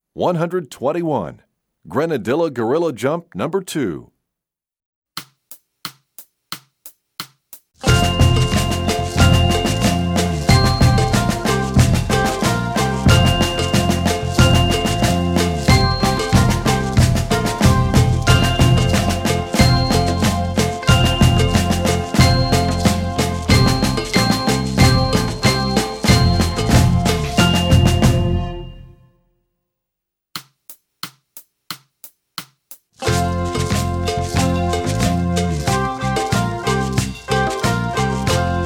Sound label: Percussion; Grenadilla; EE2000 Book1